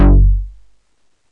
POLY-6; Korg

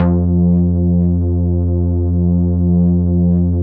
MINI, MOOG BASS

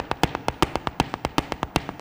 120 STOMP

